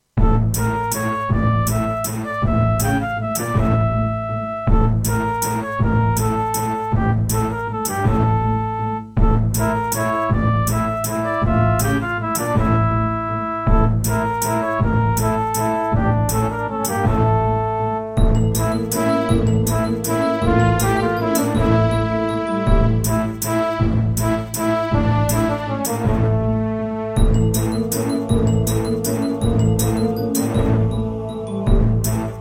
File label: Roland SonicCell GM2 TEMPLATE